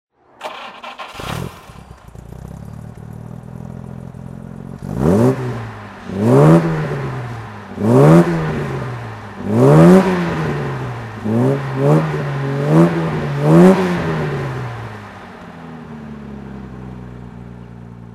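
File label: jaguar e type 42ots throttle, Engine sound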